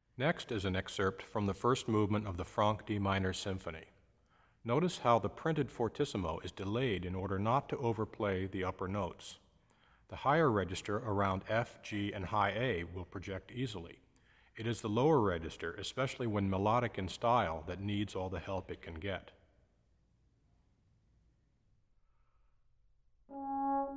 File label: Symphony In D Minor, Orchestral Excerpts For Trombone